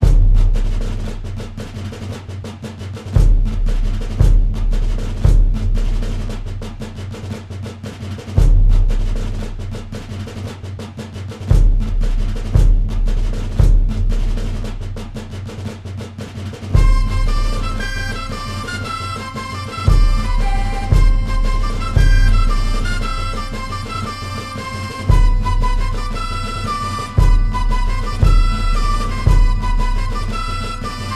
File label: Fife and Snare on the March